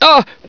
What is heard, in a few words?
sci fear, scientist